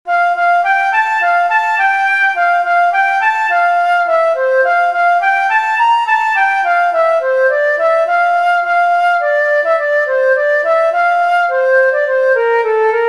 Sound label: Yankee Doodle Key of F, flute solos